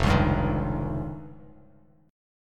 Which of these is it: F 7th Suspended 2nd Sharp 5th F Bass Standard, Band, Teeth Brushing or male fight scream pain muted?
F 7th Suspended 2nd Sharp 5th F Bass Standard